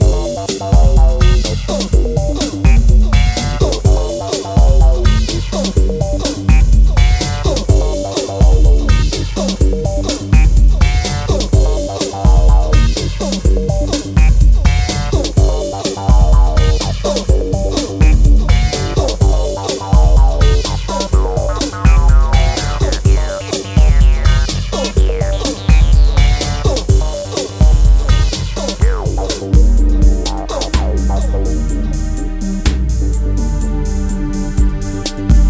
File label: Kick The Break In